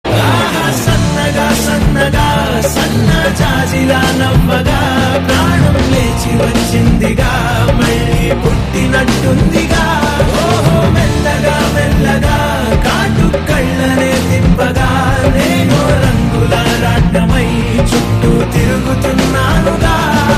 Male Ringtone

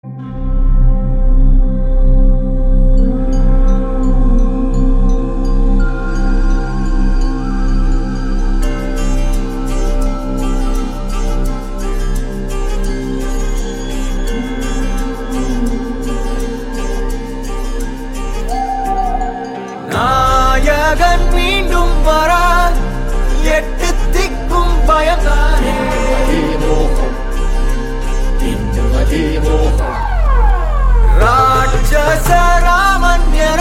Background Score

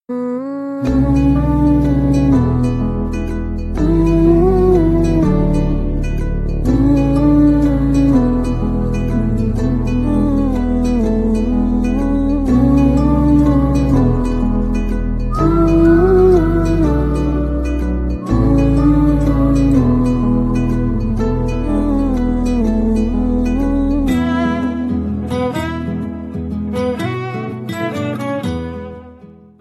Sad Bgm; Kumbalangi Nights

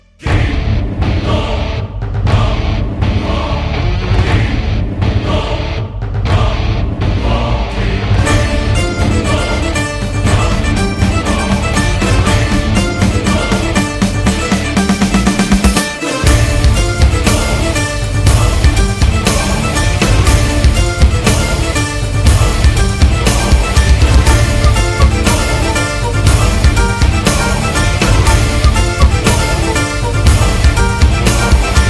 Theme Music Ringtone

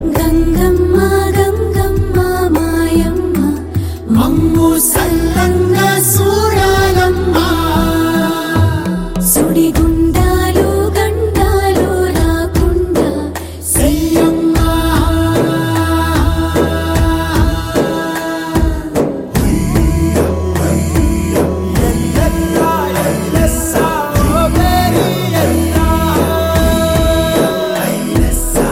Female Ringtone

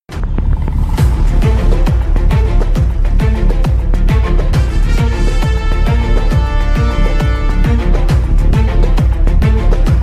Climax BGM; Drishyam 2

